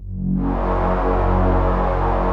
APPROACH, PADS; Masterbits; Vintage Voltage

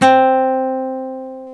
GTR nylon

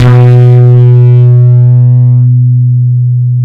FUZZ B 3, bass; Spectrasonics; Bass Legends

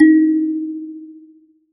Finger, Prepared Kit; Battery 3 Sample Kits

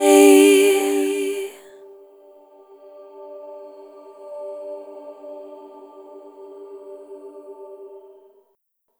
Chord G-D 5th; Sonic Foundry (Sony Creative Software); Pocket Diva World Class Vocal Samples

